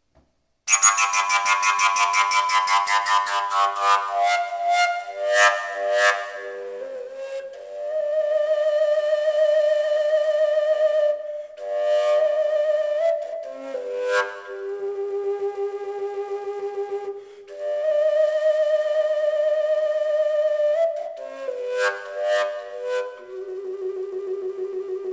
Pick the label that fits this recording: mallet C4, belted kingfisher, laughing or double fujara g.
double fujara g